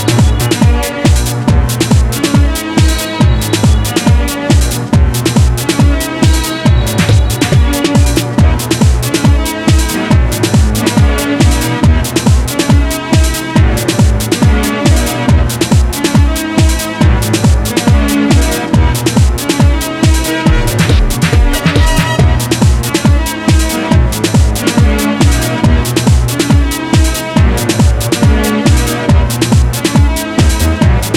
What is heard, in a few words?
Melancholic Strings